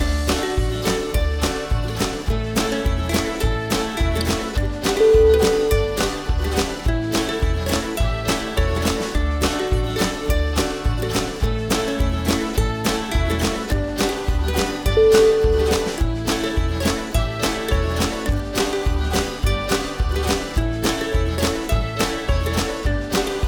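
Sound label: celtic roadtrip, music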